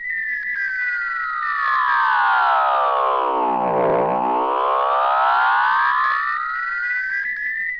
alien space noise

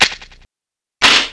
superdragon reload

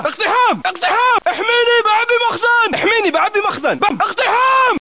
arab shout

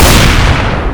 auto shotgun fire, weapons

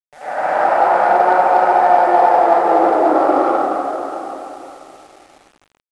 speaker scream

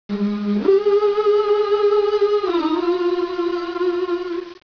ooh

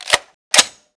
m16 cock

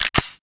glock slide back

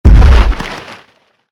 dragon step; ragemap2024; grunt

